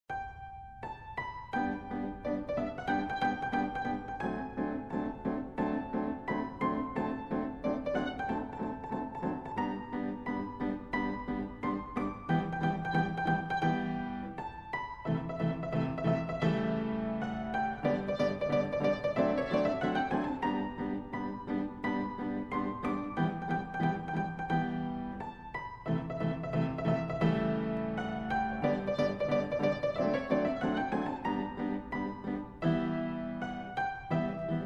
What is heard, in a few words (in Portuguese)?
licao de pianoforte